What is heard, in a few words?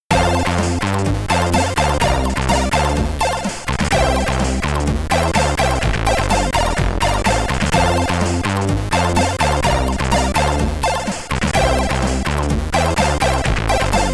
SID Stereo, commodore 64; Dyter 07